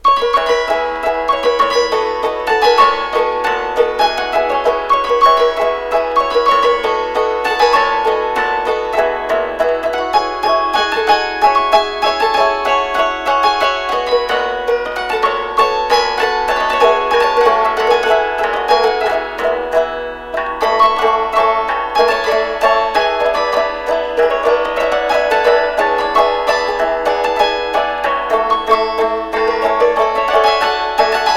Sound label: Polka